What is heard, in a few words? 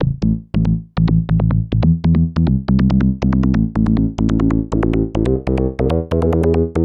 SNTH BASS TEKNO 140 A